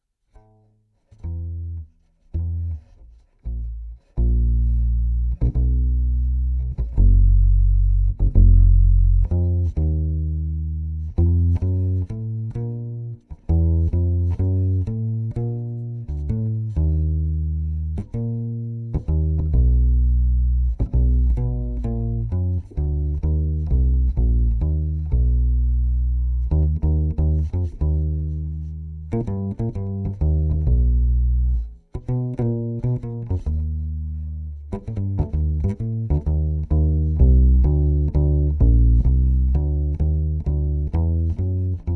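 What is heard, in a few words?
Contrabass DI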